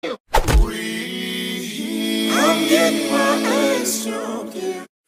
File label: wreeeeee